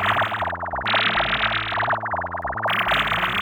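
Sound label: synth electro 140 A; Techno; Keys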